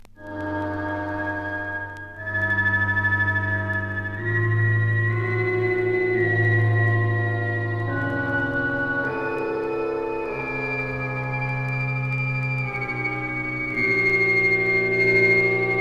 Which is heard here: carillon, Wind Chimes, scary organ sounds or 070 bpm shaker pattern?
scary organ sounds